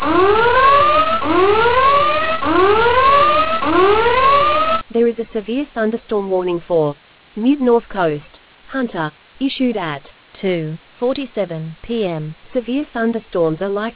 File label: storm audio